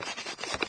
paper pencil; deliantra

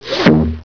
fwoomp; deliantra

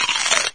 biting celery; deliantra

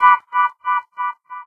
wah; deliantra

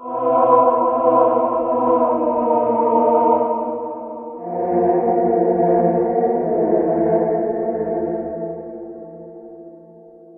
choir; Deliantra

